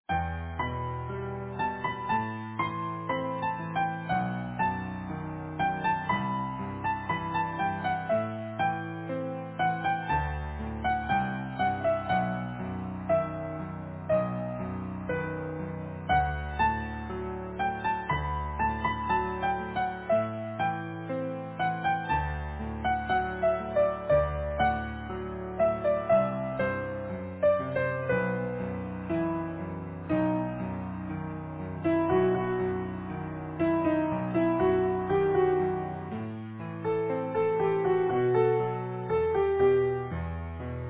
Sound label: Easy Piano